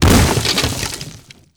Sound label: crate break; Fate The Traitor Soul; ITEMS